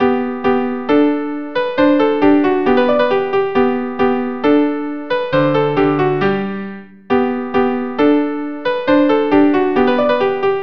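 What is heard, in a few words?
violin cello duo, allegro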